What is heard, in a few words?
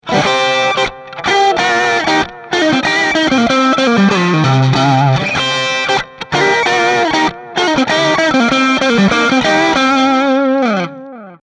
Licks Mid